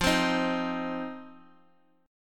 F 5th 7th F, chord; F57 strummed

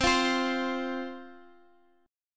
F 5th C, chord

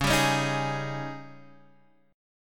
G sharp Major 7th Suspended 4th, C sharp

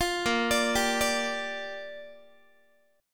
A sharp 6th, Guitar; A#6; arpeggiated

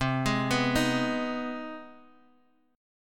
C Minor Major 7th Sharp 5th, Guitar, Open D; down; chord shape

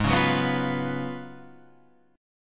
Gb Major 7th Suspended 2nd Suspended 4th

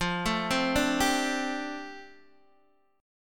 F 6th Add 9th F down Guitar DADGAD, chord

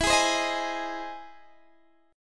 E Augmented Major 7th E down Guitar Standard; strummed; E+M7; JGuitar

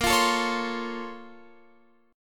A sharp Minor Major 9th, down, Guitar, Open F; A#mM9 strummed; JGuitar

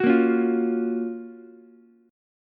F sharp Major 7th, Ukulele; strummed; JGuitar

